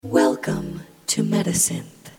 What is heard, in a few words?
fft pitch